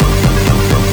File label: Fill Ins 128BPM; Vengeance Samples